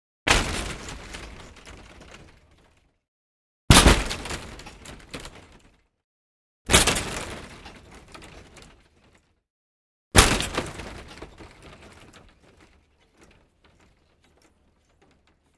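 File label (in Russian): удар хоккеиста о борт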